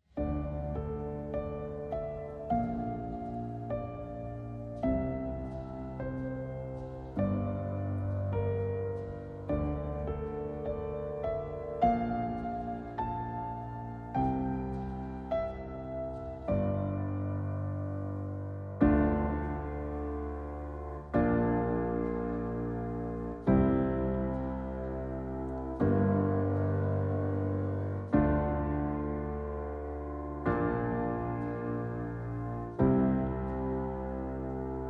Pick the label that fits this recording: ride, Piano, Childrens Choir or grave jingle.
Piano